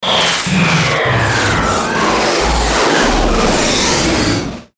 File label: Glitch Robotic Feedback Harsh Noise, Glitch Sweeper High Frequency Short Static Stutter Fizz; Production Element Imaging Element Accent Transition; Blastwave